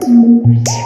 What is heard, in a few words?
animation Change; Animation Shower